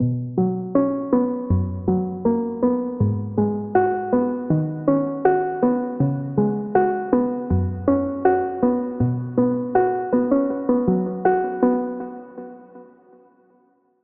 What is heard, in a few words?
Key; Hidden Path; Routed Sound; Spire Expansion; Story Walker Vol.1